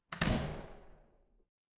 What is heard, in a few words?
door close